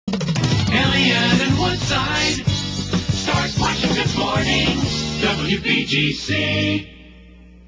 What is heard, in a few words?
jingles; wpgc; 1980; jam; positron; dcs morning